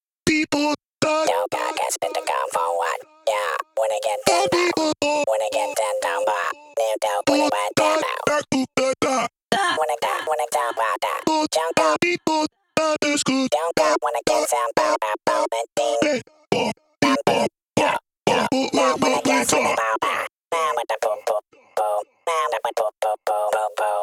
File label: Voices